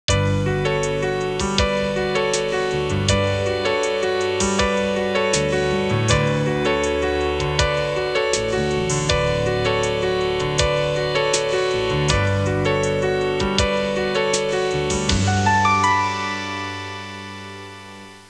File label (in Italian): musica newage